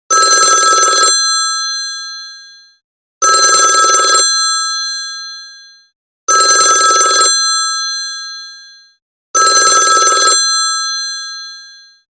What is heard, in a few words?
Old Phone, ringtones; NGM; WeMove Miracle